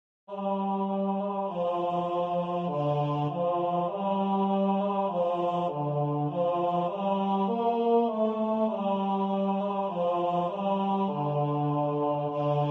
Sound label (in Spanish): gradual coro